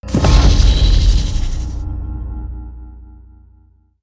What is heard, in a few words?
e skill b snake 1 to 2 evolution